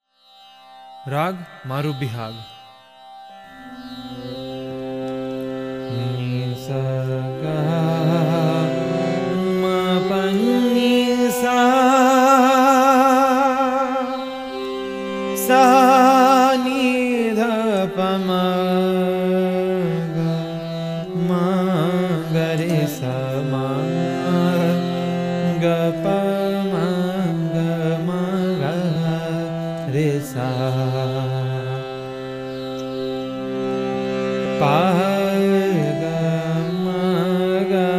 raga maru bihag

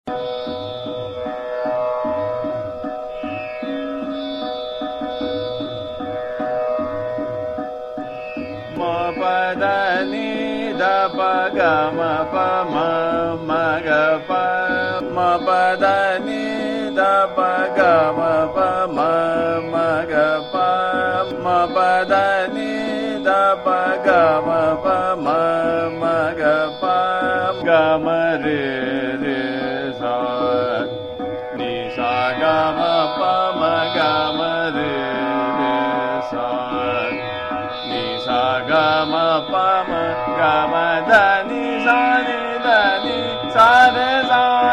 Sargam - Ma Pa Dha Ni Dha Pa - Trital, Ramkali

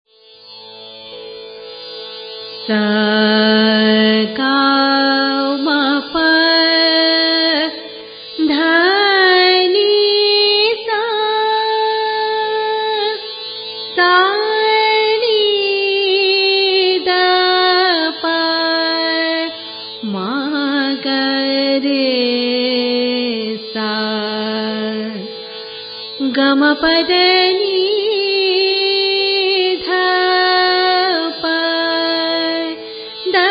khamaj, raga

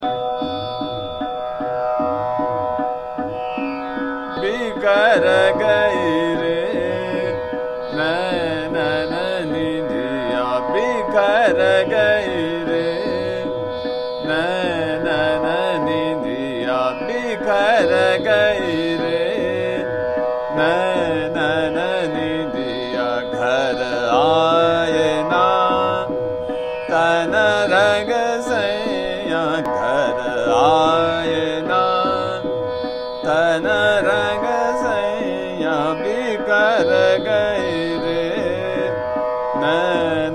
Chota Khyal - Trital, Bhatiyar; Bikhar Gai Re Nainan Nindiya

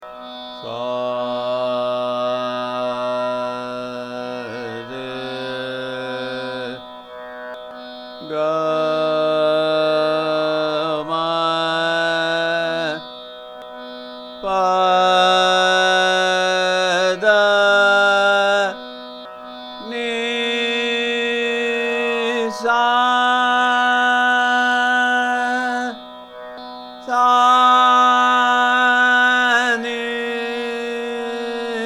Bhairav Aaroh Avroh

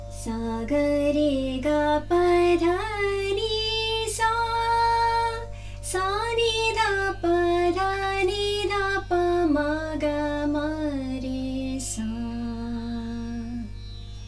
Bilawal Aroh

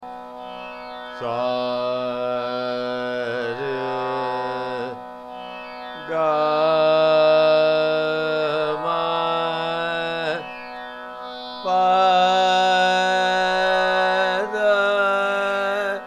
Basant Mukhari Aaroh Avroh

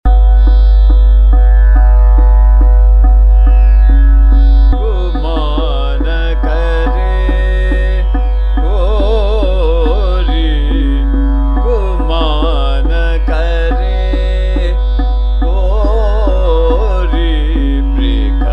Chota Khyal, Trital; Kafi; Guman Kare Gori Brikhbhan Kishori Radha

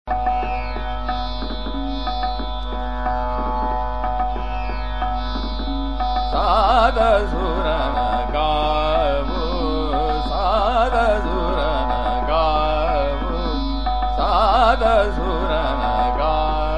Chota Khyal, Ektal Drut; Sat Suran Gao; Yaman

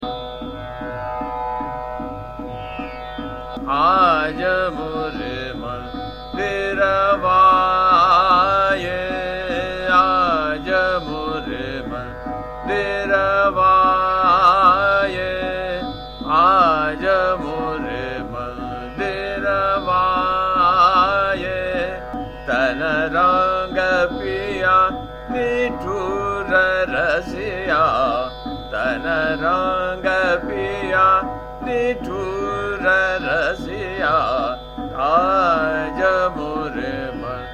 Chota Khyal - Trital, Vibhas; Aaj More Mandirwa Aaye

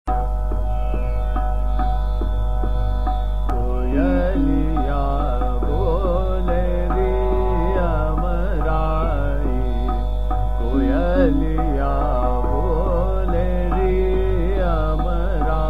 Chota Khyal - Trital, Maru Bihag; Koyaliya Boleri Amrai

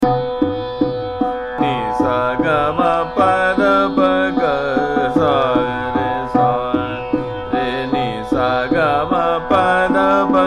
Sargam Ni Sa Ga Ma Pa Dha Pa Trital, Bhairavi

